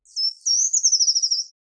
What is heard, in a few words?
Certhia familiaris